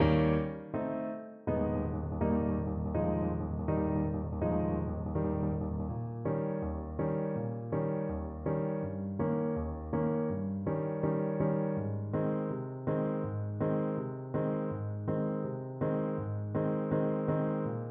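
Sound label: thunder alto sax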